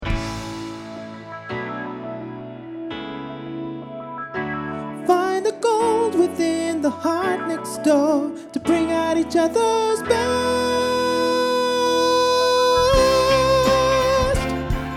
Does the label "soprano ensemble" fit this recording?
Yes